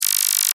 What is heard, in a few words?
Science Fiction Scanner Beam Short; Tech